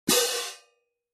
16in Classic Orchestral Selection Medium Light Pair, cymbal sound